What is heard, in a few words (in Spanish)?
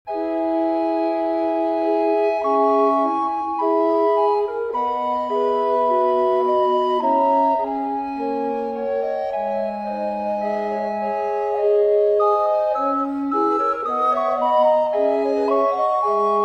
Pavana; Agustine Bassano; Renacimiento; HISTORIA DE LA MUSICA